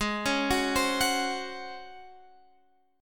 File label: Db Major 7th Suspended 4th Ab